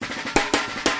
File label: normal intro, DRUM LOOPS and BREAKS, FILLS and ROLLS